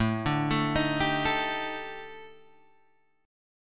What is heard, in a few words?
D sharp Major 7th Flat 5th A, chord